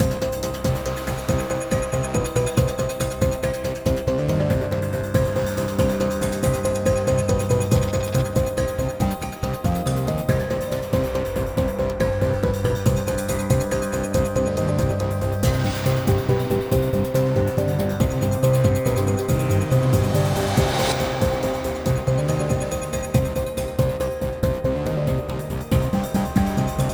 Pirate Turn; Sid Meier's Starships

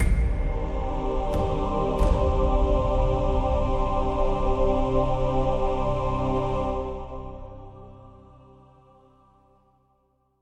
music Defeat Stinger; Halo: Spartan Assault; Xbox 360

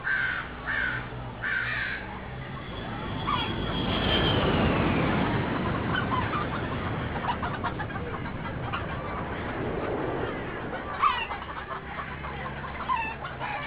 Amb Slum Layer; Street Cricket Champions; PSP

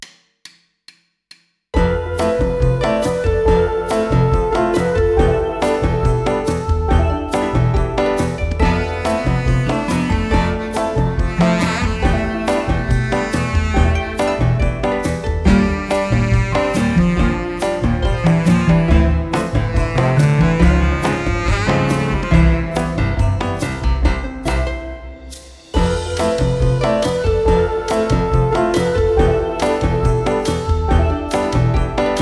New Wave Bossa Nova; majoras mask orchestrated vol. 2 2018

